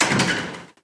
metal door close

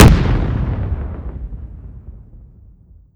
explosion far

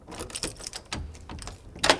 door key open

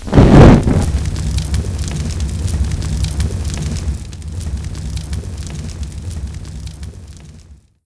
firebomb

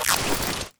bandage

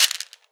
pills bounce

